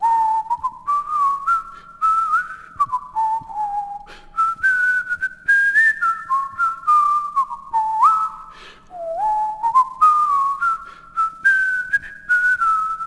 town merchant; Sid Meiers Pirates; XBOX

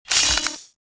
pickup shield; player

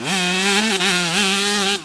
high, Engine

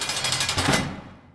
CATCH SPEEDER; Cars; XBOX

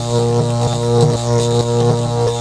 electricity; Tony Hawk's Project 8; XBOX

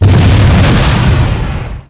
Small Exp, Explosions

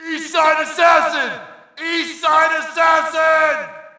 Shout, audience; UFC Tapout 2

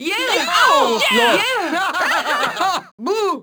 miss, Crowd German

